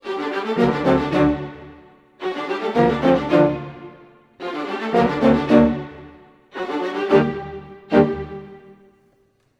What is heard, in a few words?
110 g min full mix, orchestral arrangements